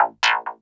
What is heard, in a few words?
Stabs, Elektron MachineDrum SPS1 MKII